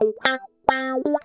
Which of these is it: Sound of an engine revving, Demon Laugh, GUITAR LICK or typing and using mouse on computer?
GUITAR LICK